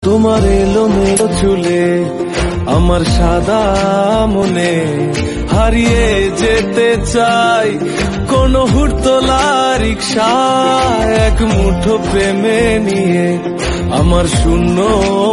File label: bengali